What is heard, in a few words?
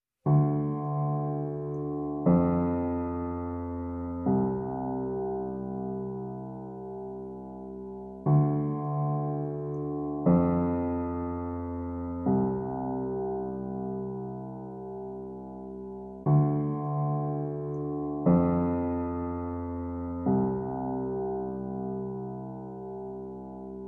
Straight Piano Only Mix